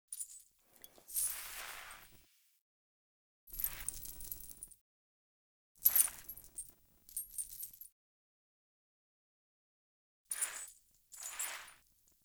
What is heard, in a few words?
Foley; Friendly; Verminia; Bromius Quest